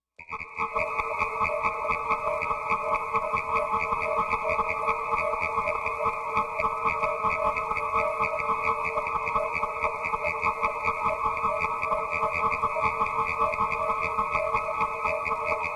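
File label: Mechanical Frogs Machine, alien swamp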